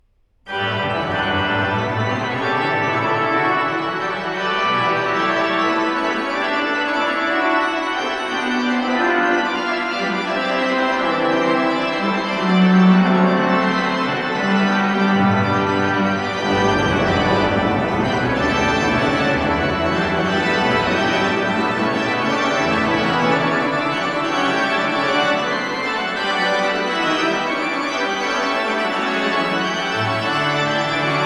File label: Goldberg Variations BWV 988 Transcr. for Organ; J.S. Bach